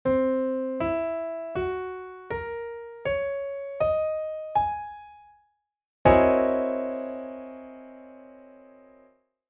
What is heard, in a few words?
chord pf 7b5b13